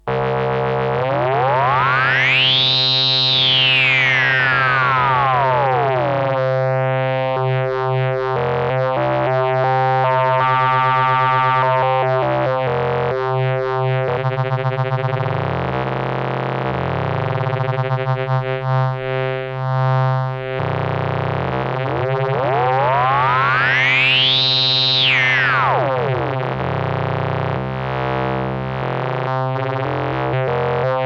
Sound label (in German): Red Panda Radius Synth Ring Mod mit LFO